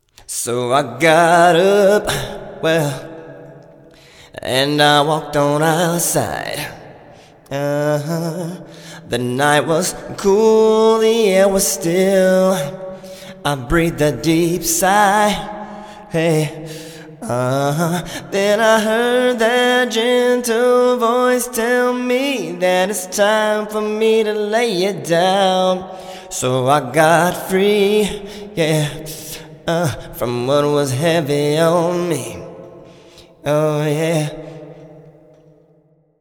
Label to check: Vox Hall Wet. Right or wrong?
Right